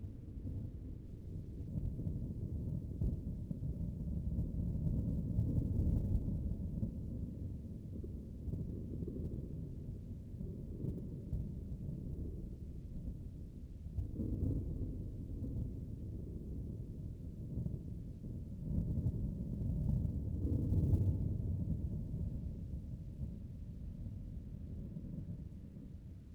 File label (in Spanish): tormenta lo-fi; jose tome